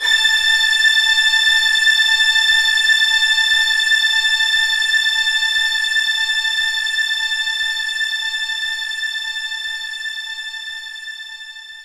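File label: String Loop A5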